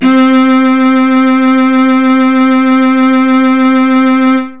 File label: CELLO